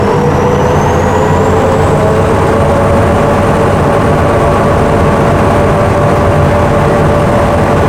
v8 start loop; vehicles

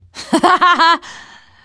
laughter, teen girl

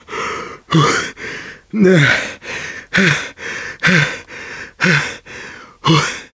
heavy breathing; survivor

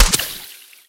underwater impact bullet; physics; surfaces

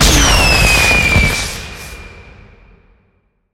firewerks launch; atmosphere

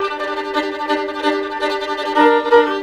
violin, fiddle; zombat

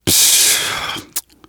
negative noise, survivor voice

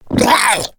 female zombie growl; boomer

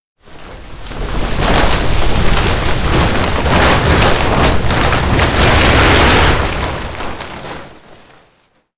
tarp blow away

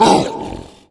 tank voice; voice, idle

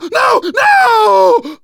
grabbed by smoker, survivor voice; manager; Left 4 Dead 2; left4dead2 dlc3

